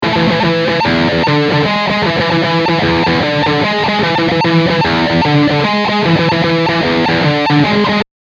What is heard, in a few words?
BRIDGE drive; eclipse active; line6